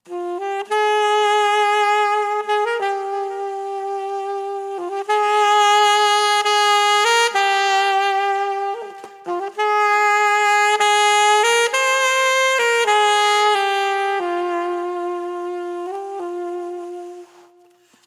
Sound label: Romantic Saxophone Interlude